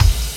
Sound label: Kick hatz; Mo' Phatt; Digital Sound Factory Mo' Phatt